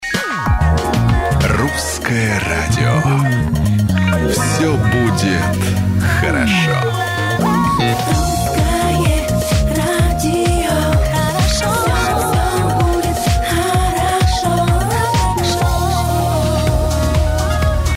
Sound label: Russkoe radio